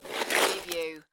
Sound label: Snow and Ice, Ice skate slide